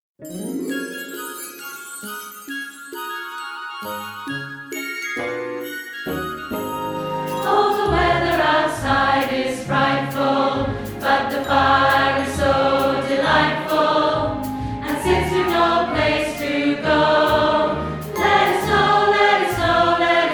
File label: ALTO